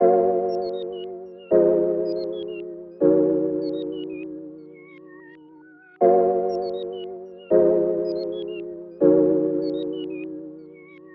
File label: 80 BPM A# Min Chord, Lofi; Melodies; Loop Stems; Cymatics; GHOST (Beta Pack); Away Again